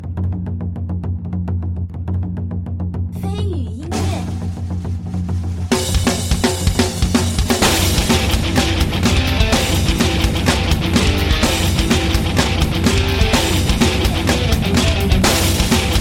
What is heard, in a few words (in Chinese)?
战斗音乐